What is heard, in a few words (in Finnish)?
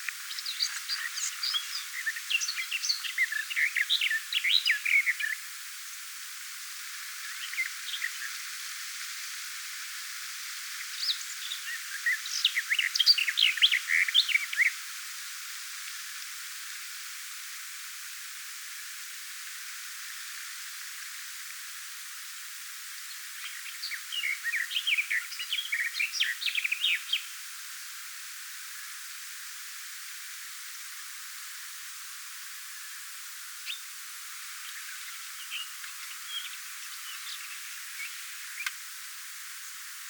lehtokerttu lauloi puskikossa